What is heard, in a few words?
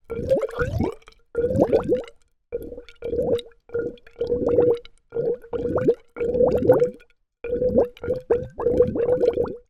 water gurgle in pipe air tube multiple